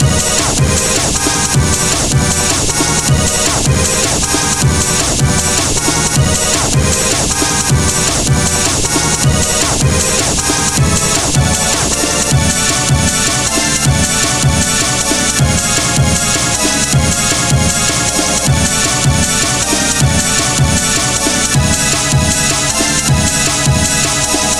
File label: test loop